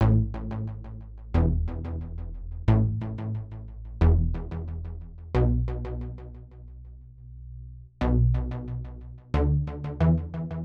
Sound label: Latin HipHop Synthbass Amin; Digidesign; Pro Tools Essential Loops